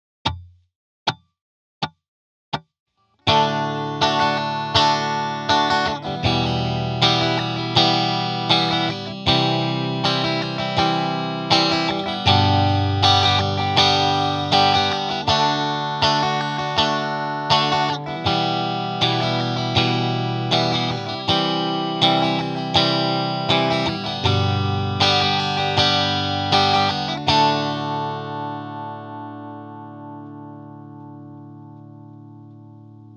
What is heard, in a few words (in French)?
Rythmique; Les doubles croches; Les rythmiques II